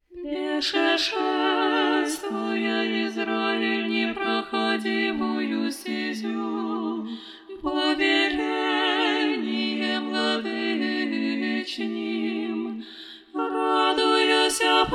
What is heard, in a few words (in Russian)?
irmos glas 5